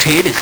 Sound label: LSDj, Nintendo Gameboy; 300 Drum Machines; Ghetto